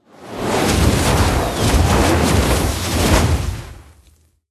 Firerain; Hero Beresad Hellfire

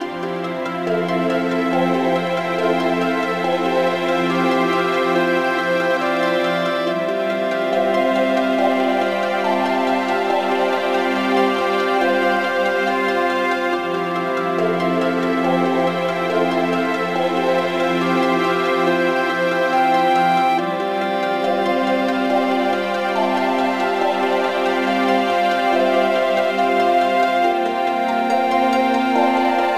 Bonus; Zenses Ocean; nds gamerip

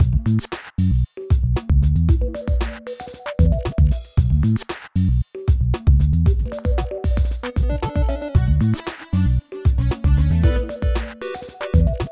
Menu Beat; dunk lords

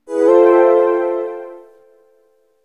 Menu Turn Center; GameCube System Sounds; GC

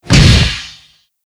jetpack other

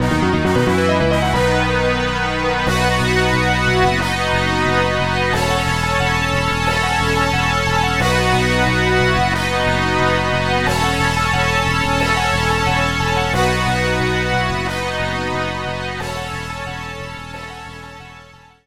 BGM Battle end; Dark Gate RPG; android

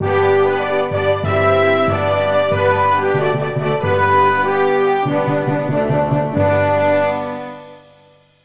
Anthem China; Buzz! The Mega Quiz; PS2

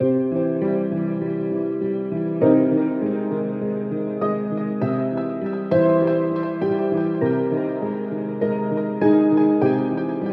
Lounge Song; Little Red Lie; ios macos ps vita ps4 windows

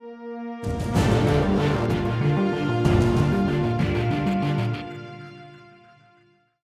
190bpm; Legends of Pegasus; windows gamerip 2012

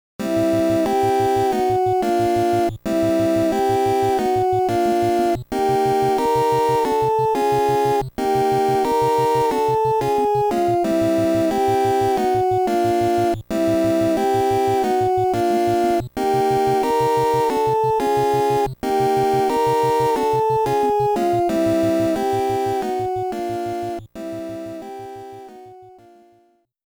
Volcano BGM

